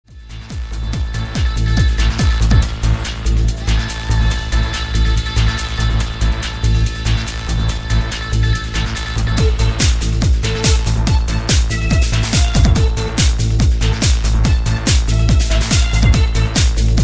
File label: trance electric trance